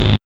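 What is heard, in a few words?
synth buzz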